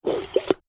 household cafetiere plunger push down fast coffee inside; zapsplat